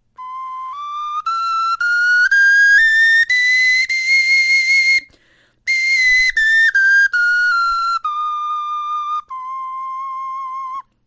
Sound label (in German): tonleiter